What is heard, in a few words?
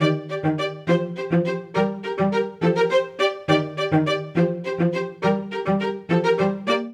Ostinato Polyrhythmic G Minor 138 bpm, string quartet; VI-VII-i-VII; MIDI Clips; Ableton; Spitfire Audio